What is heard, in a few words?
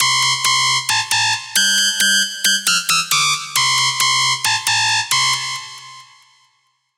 Senseless, lead